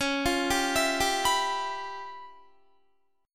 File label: Db 7th Sharp 9th Flat 5th Db, down, Guitar; arpeggiated; 4F,4A,5C,5G,5C,6E; JGuitar